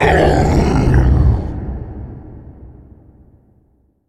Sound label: npc super mutant behemoth injured